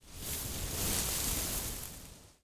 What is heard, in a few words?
grass